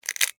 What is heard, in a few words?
Insect Claw Pinch, Snap, Movement, Bug; Animal; Insect Movement Sound Effects